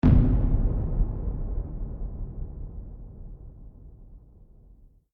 Drum Trailer Horror War Drums Single Hit, War Drum Tonal Single Impact Accent Boom Deep Thud; Sound Design; Trailers